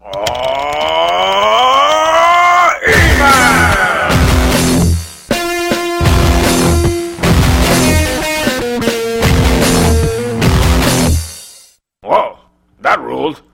email song

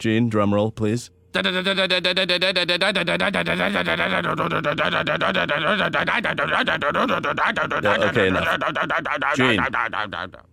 drumroll; Bob's: Gene Drumroll

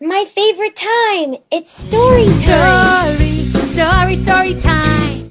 story + song

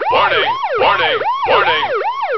warning

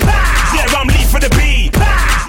pow

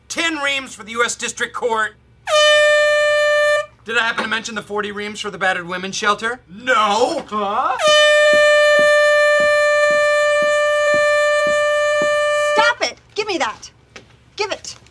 office horn; The Office: Horn (long)

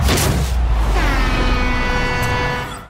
goal

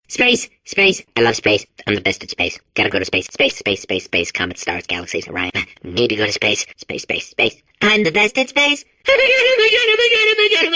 space long; Portal 2: Space (Long)

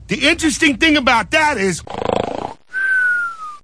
snore; Bender is Bored; Futurama

